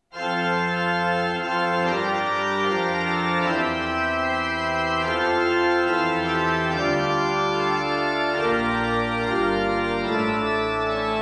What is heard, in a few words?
Fanfare, orgelet; tynset